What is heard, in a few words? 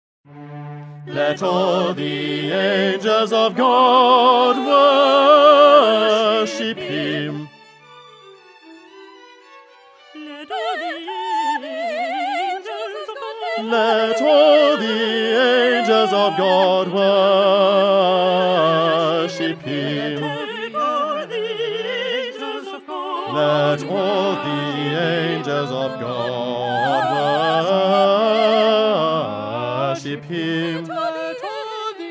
bass, messiah